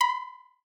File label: Countdown